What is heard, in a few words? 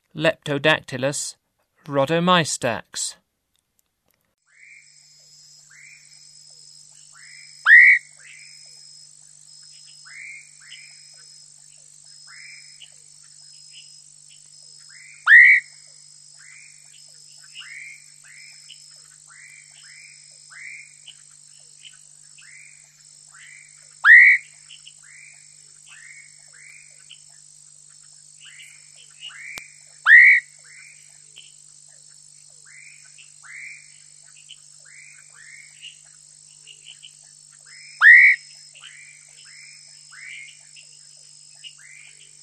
Leptodactylus rhodomystax, Frogs of Ecuadorian Amazon; S. America